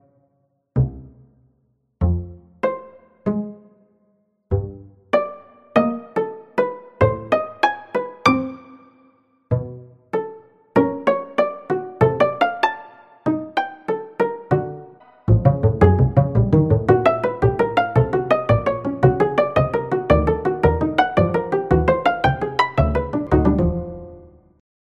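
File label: upright acoustic double bass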